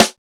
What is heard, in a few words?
Tight Snare; Drums; Snares